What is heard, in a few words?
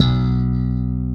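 5 string bass, B1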